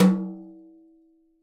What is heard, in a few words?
DW Tom Brush; Digital Sound Factory